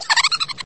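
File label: giggling ringing childish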